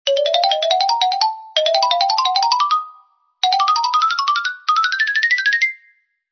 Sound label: XYLOPHONE